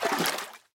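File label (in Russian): ласковая волна нашедшая на камень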